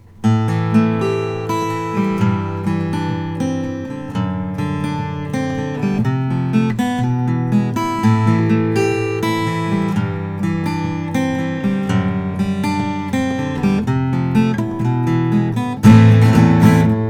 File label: martin custom 00 18 12 fret; natural